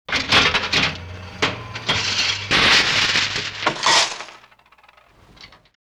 Slot Machine Jackpot; Vintage Cartoon; Foley

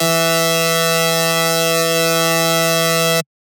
Lead Shot E Buzz, Dubstep; One Shots; Ghosthack; Dubstep Sound Arsenal

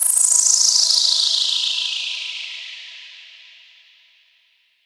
Techno FX 135

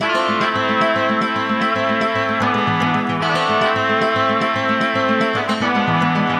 Various Melody Loop 150 BPM A Min; Cymatics; Oracle Sample Pack

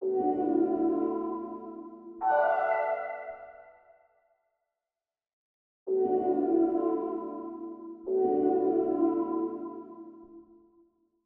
164 BPM B Min Vocal Synth; Loop Stems; Cymatics; Rose

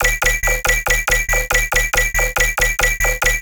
Bass Loop D# 140BPM, Dubstep; Sailing Bass; Ghosthack - AC2024 - Day 13 - Dubstep Sounds 2025

